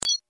Iphone Connect

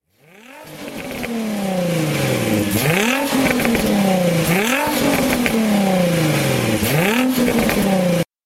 Nissan 350z

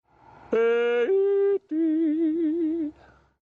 Yodel

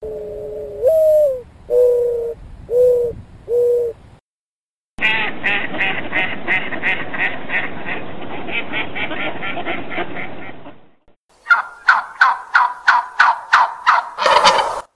Dove Duck and Turkey

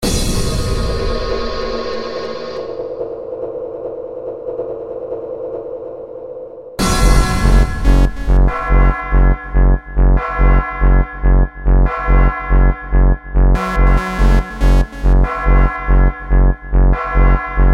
Apocalyptic Bell

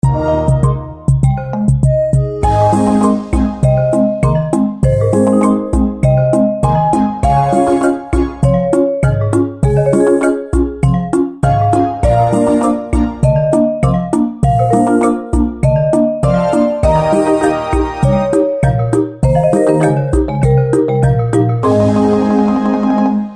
Rhythm; Windows

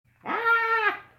Funny Scream

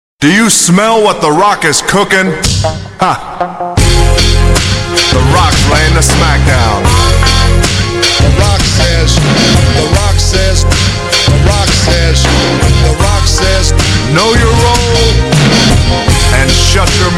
Rock

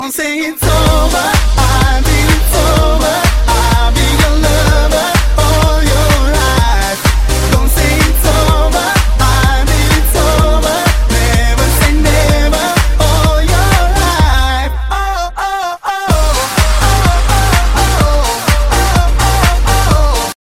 New Dance Music

